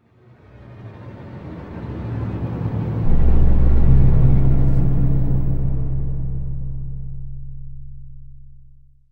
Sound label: amb strings; gamerip; blackfaun